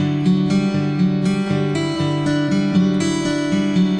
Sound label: acoustic guitar picking